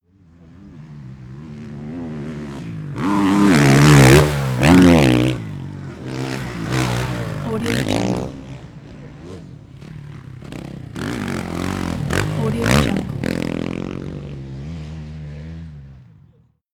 passing by motocross motorcycle

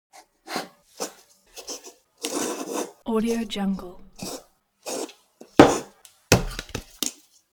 object household cup handle

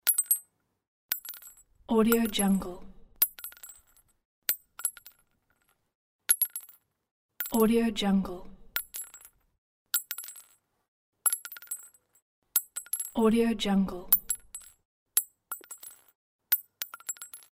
gun shells falling on asphalt

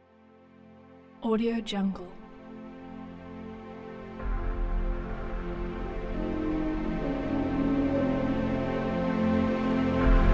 cinematic majestic gorgeous and powerful universe

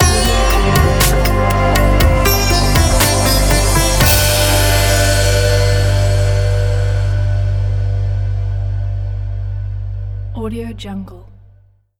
india logo; andyslatter

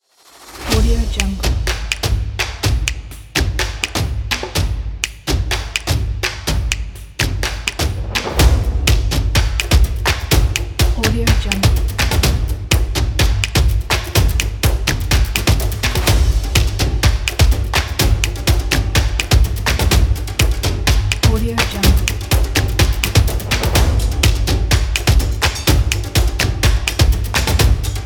percussion; lulu-production

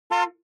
transportation car horn short exterior hummer h3

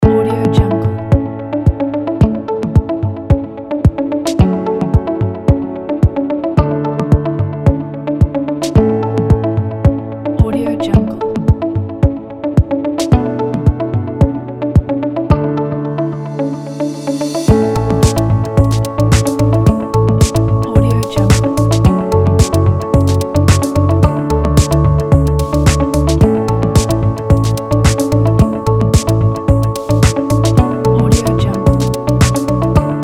science corporate

